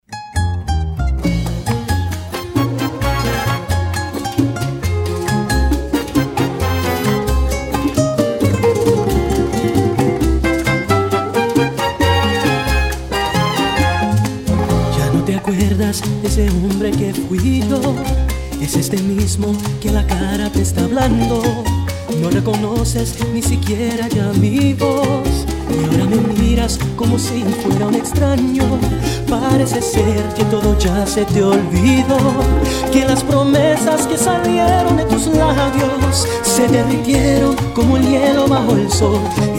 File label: Cha Cha Cha